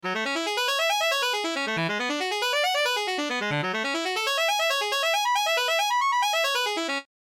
Arpegio Lick

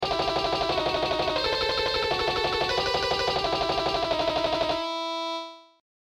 Shredding Exercise

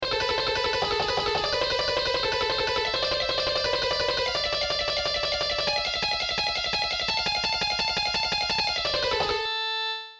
Shredding Exercise

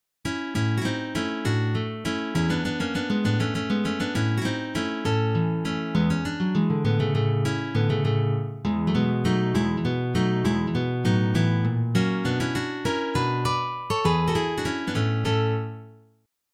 Mazurka; Glinka Mikhail

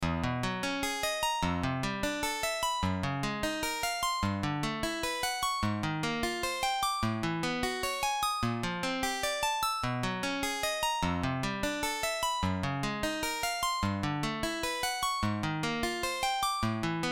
circle of fifths

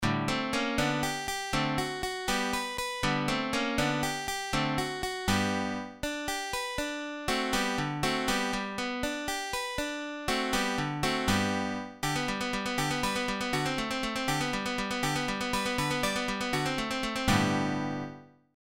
Spanish waltz; Paganini Nicolo

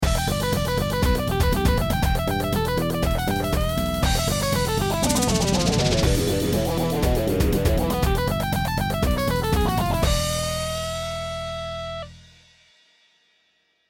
Small Guitar Solo; Rock Licks; Hard Guitar

